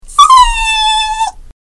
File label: cry